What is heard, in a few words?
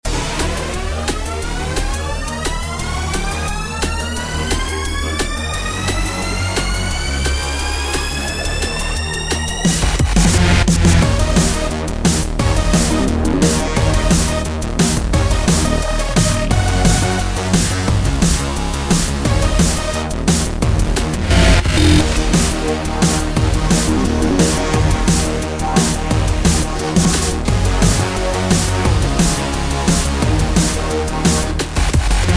music ingame; lego speed champions ios gamerip 2017